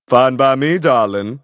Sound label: grooves positive; tf; a hat in time